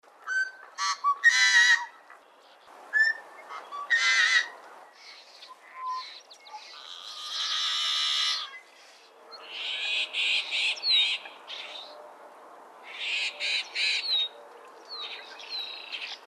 BLACKBIRD YELLOW HEADED; species/birds; wbba

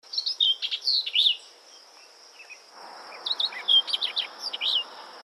oriole orchard; species/birds; wbba

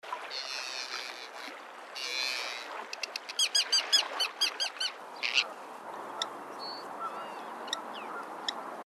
TERN FORSTER S, birds; species/birds; wbba

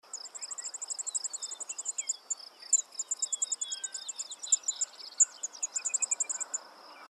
SPARROW LARK, birds; species/birds; wbba

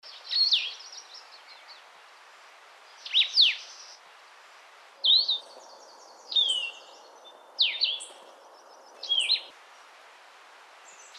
VIREO BLUE HEADED, birds; species/birds; wbba

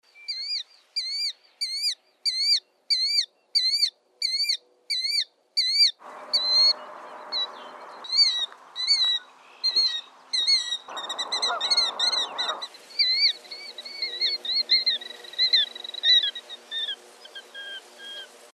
KILLDEER; species/birds; wbba

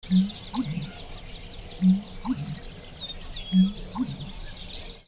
BITTERN AMERICAN; species/birds; wbba

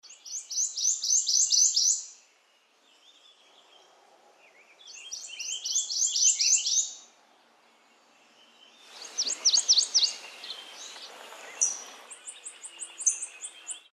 WARBLER PROTHONOTARY, birds; species/birds; wbba

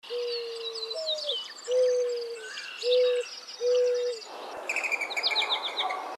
DOVE MOURNING; species/birds; wbba

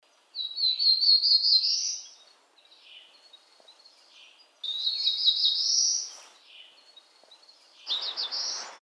WARBLER BLACK THROATED BLU, birds; species/birds; wbba

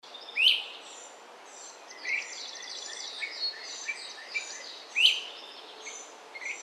FLYCATCHER GREAT CRESTED; species/birds; wbba

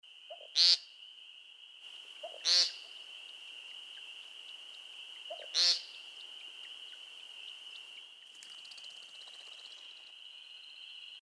WOODCOCK AMERICAN, birds; species/birds; wbba

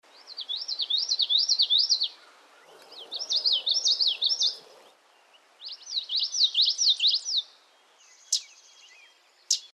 YELLOWTHROAT COMMON, birds; species/birds; wbba

